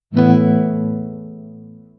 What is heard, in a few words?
a short crisp guitar stru